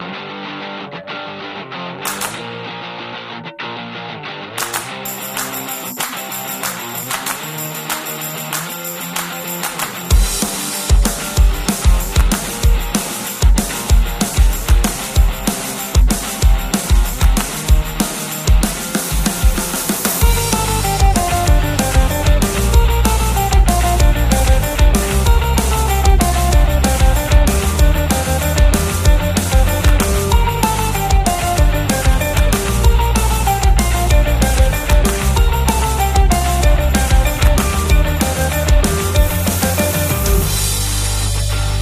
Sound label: Car Racing Indie Rock